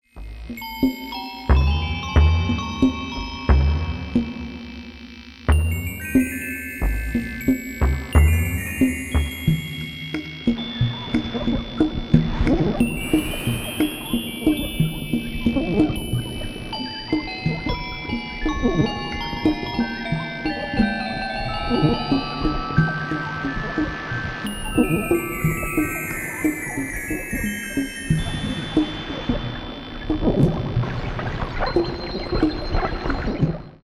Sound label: ambien; MUSIQUE CONTEMPLATIVE